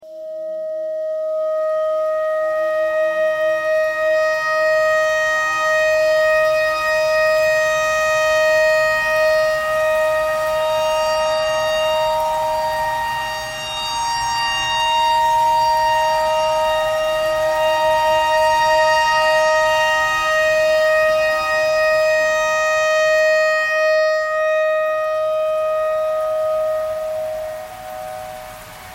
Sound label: tornado siren clarinet notes e pentatonic